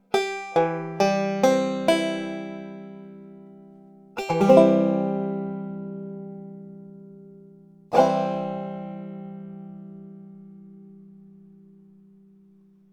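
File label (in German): der c dur akkord, banjo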